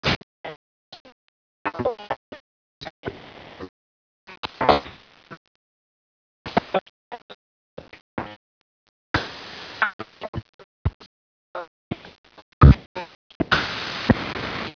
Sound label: bad transmition